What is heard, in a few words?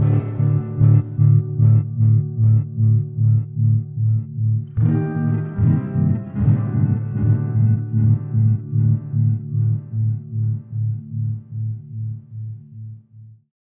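Rhythm Guitars D, Lounge; Zero-G Kocktail Kollection; Construction Kitz (Lounge); Volcano at Sunrise 074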